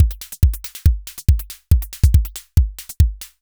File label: Anabeat Mix, Beats